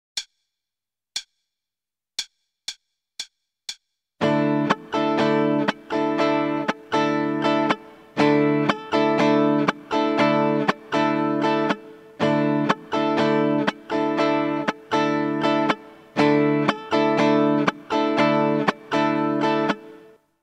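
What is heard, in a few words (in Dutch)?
Ritme, gitaarstarter; Meespeel CD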